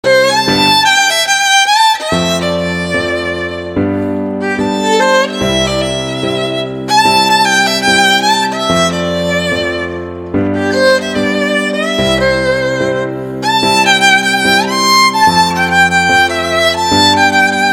VIOLIN RINGTONE

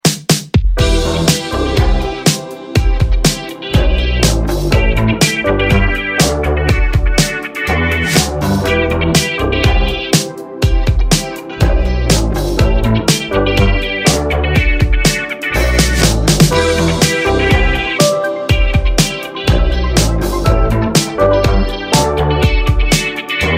INSTRUMENTAL RINGTONE

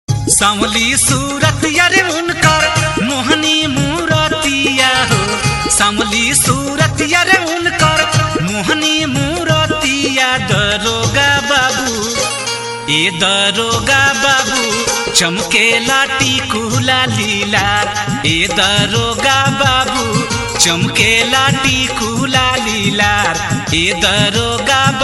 BHOJPURI RINGTONE

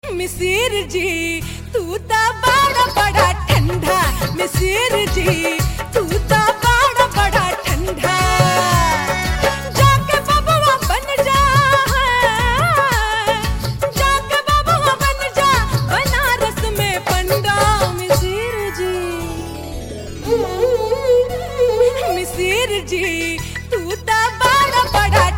BHOJPURI RINGTONE

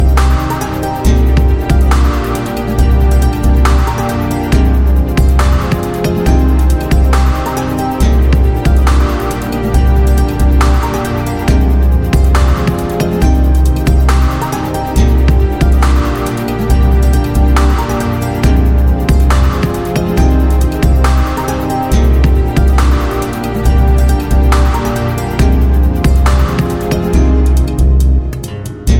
OUD

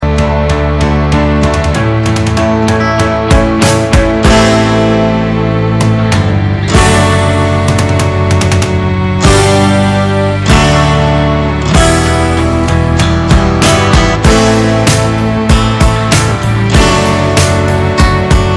SHALLOW INSTRUMENTAL RINGTONE

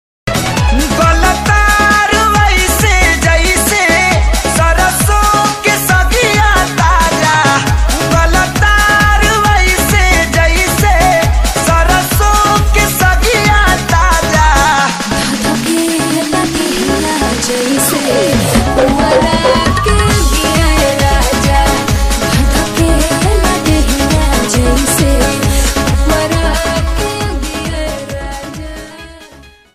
BHOJPURI RINGTONE

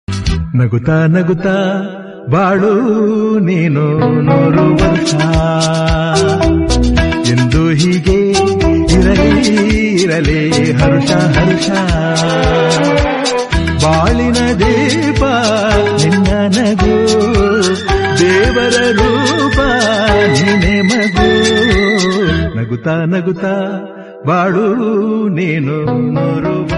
KANNADA HAPPY BIRTHDAY SONG RINGTONE

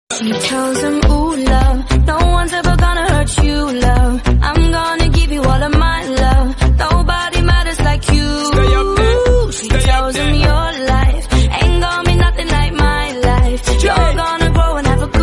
SLOWED REVERB RINGTONE

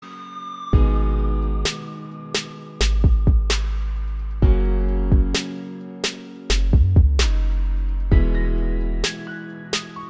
INSTRUMENTAL RINGTONE

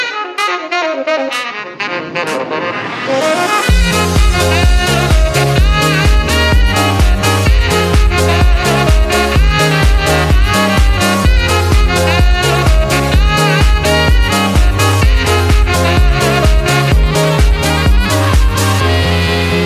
music ringtone

